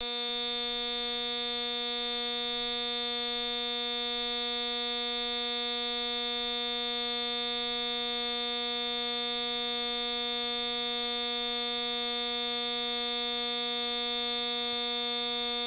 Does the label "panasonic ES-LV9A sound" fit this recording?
Yes